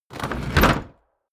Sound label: chest wood medium close